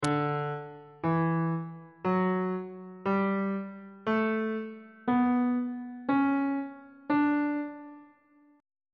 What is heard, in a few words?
d ionian mode on bass clef